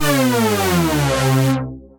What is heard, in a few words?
Saw Struck, Effects